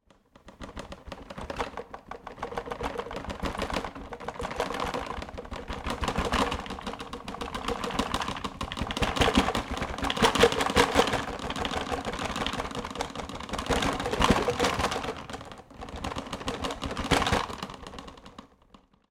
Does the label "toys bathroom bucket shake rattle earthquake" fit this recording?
Yes